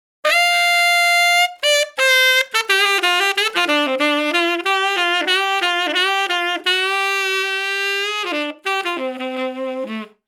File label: 90bpm 5bar; Help Me